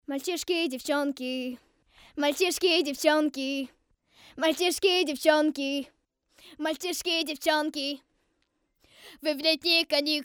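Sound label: vocal; Akvapark; pod Eralash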